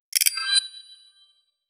premium purcha, success

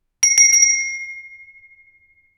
boxing bell ring to end

